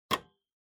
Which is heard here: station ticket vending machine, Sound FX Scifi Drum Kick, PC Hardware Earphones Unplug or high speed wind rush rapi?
PC Hardware Earphones Unplug